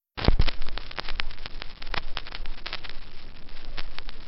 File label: tape empty; Zomboid; True Music; Tsarsound